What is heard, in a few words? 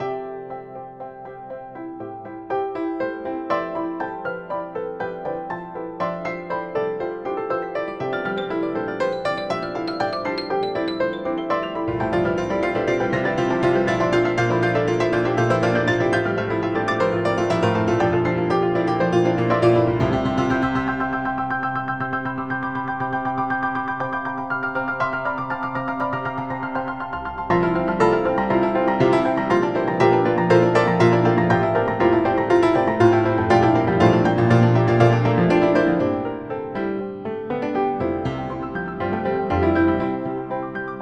pianos